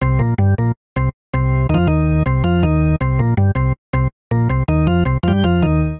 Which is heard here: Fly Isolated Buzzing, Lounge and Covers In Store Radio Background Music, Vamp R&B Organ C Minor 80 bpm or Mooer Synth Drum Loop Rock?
Vamp R&B Organ C Minor 80 bpm